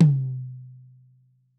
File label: tom